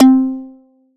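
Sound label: pluck C4, Casio VZ10M; Polyend; Simulacrum Sounds; 1 Shots; Synths; Leads and Keys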